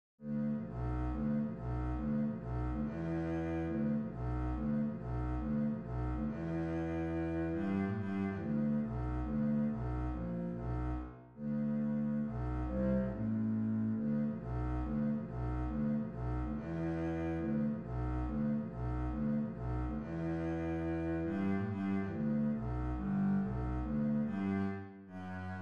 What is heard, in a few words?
ragtime bass, string bass